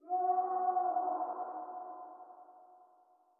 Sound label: scream far